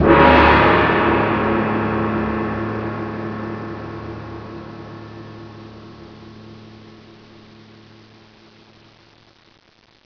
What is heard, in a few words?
gong; tfc